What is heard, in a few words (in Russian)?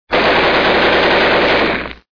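шумный звук пулеметных выстрелов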